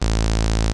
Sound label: bass